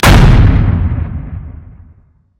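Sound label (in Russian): vystrel tanka moshchnyy zalp; vyistrel tanka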